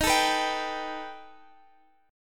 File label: B Augmented 7th D sharp